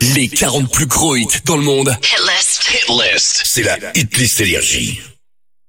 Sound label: Acap; NRJ